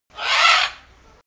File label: bird screecher; natur-tiere; voegel